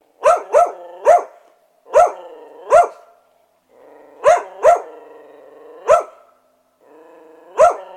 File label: barking dog sound